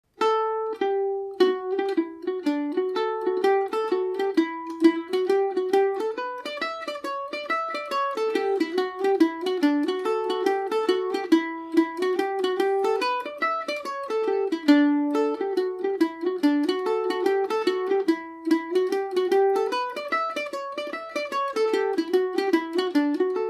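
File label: D major, Irish mandolin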